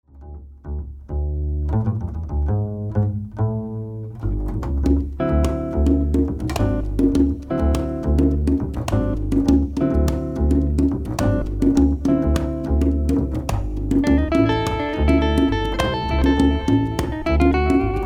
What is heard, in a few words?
Little groove